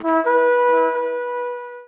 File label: attack; 帝国时代+罗马复兴